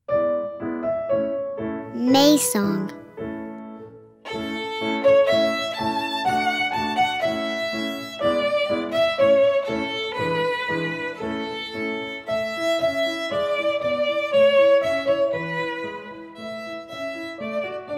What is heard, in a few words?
Folk Song, Violin; Suzuki Violin Method - Vol. 01